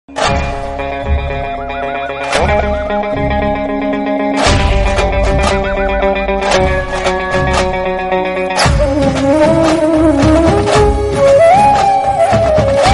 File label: bgm, kannada bgm huli dance; ulidavaru kandanthe